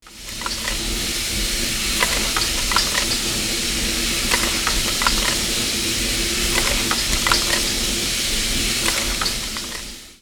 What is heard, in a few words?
Boiler Room Pump